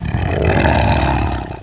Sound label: monst